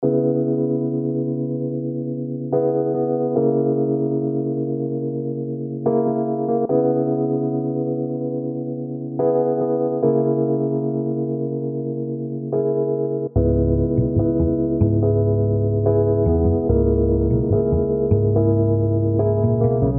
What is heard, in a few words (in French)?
peaks and love version sans guitare